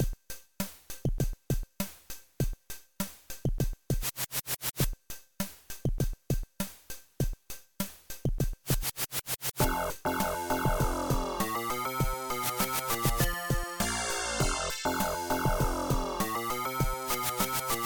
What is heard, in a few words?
RAP RAP